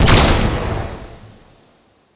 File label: impact; smite; chiron